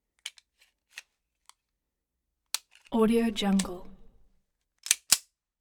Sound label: springfield 1911 45 mag in mvmt slide; soundmorph